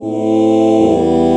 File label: oh oh chord morph